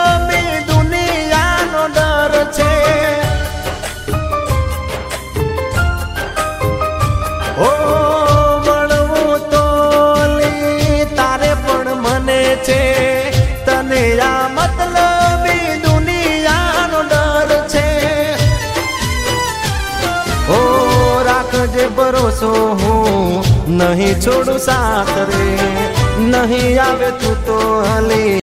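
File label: Gujarati ringtone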